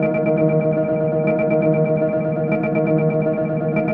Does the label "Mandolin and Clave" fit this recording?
Yes